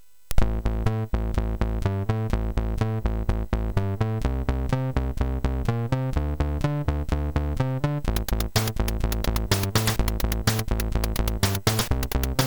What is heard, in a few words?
Bass Fever sid MOS6581R3; hvsc